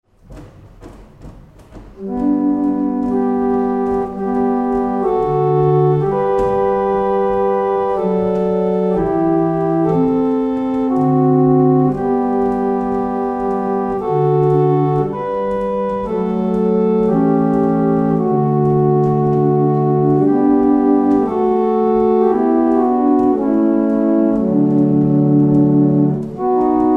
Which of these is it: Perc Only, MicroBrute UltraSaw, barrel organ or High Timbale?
barrel organ